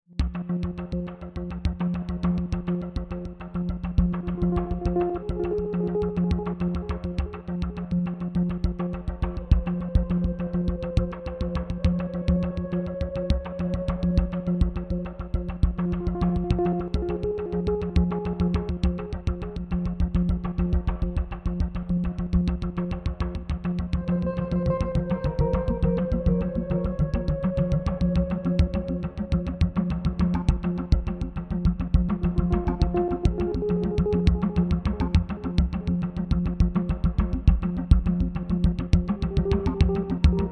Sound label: Tense Bed Clicky slow